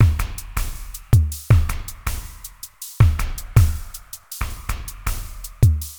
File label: drum loop, ambient, 160; eJay